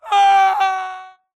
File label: grunt death crush; Titanfall 2